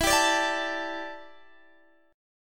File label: E Minor 11th guitar chord, Open D